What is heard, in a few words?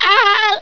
DEATH; Gnomish Flying Mashine; Human Sounds